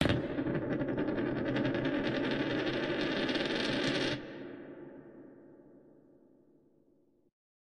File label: coin drop; gobb